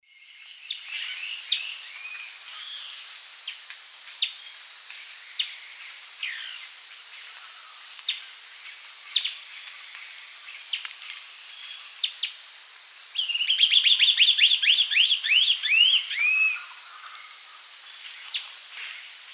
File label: Gymnopithys lunulatus, calls and song; S. America; Eastern Lowlands